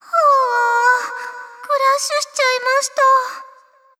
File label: track crash; ExperimentalGear